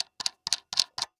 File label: Technology Electronic Light 150 Watt Fresnel Light Knob Tightening Fast